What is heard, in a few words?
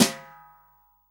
SD, REAL DRUMS, SWING KIT